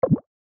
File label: diff line modified